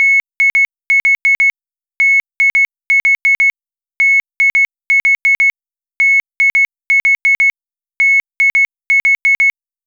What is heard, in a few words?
Tone